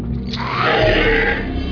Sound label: roar; Laid Bare; At 19:06 in the episode, Malik makes what could be called a V roar